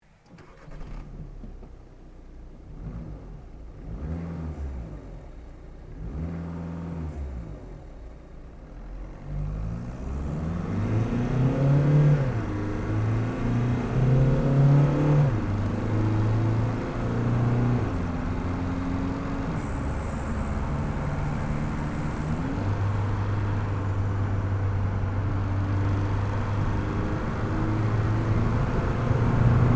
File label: Volkswagen Golf TDI